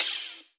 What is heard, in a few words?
Open Hihat; Vengeance Samples; VEE Cymbals